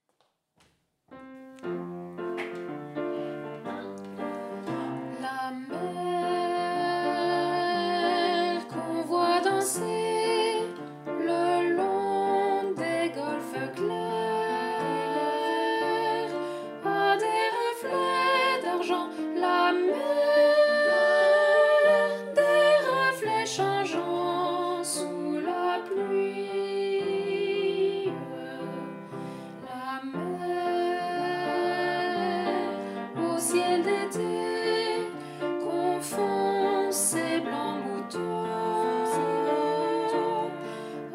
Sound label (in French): soprano